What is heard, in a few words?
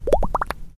Bubble Tower Place; Towers